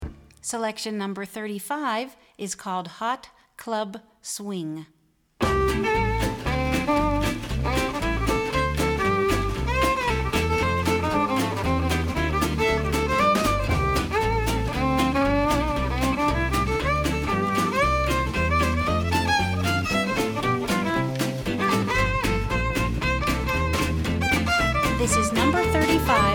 Hot Club Swing

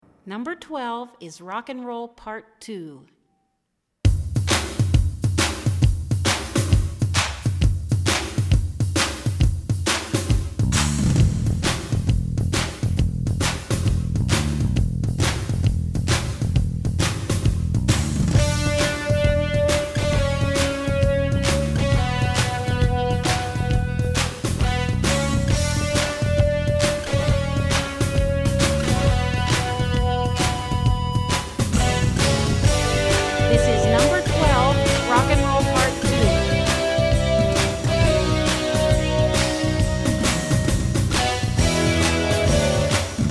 Rock And Roll

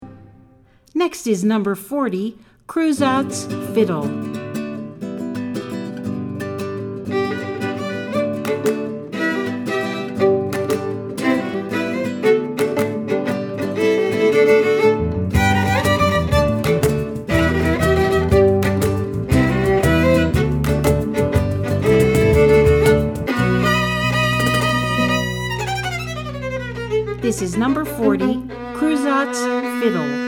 Fiddle

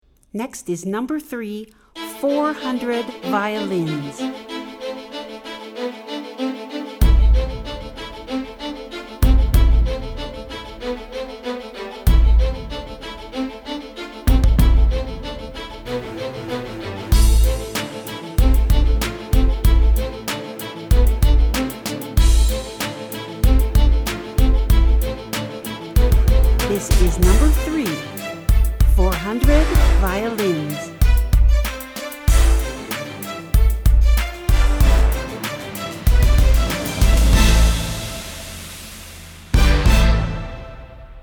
Violins

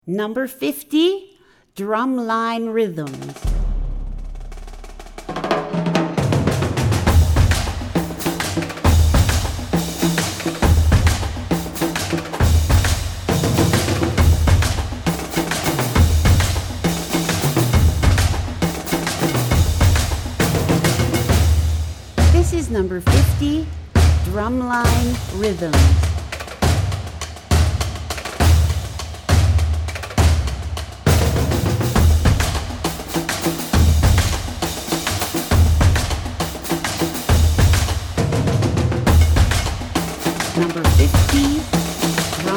Drumline Rhythms

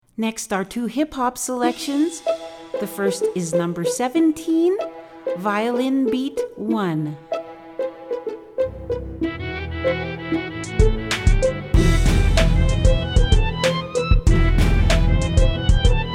Violin Beat

